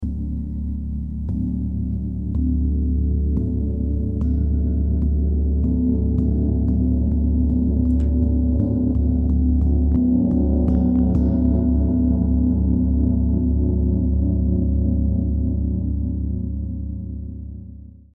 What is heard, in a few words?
feng gong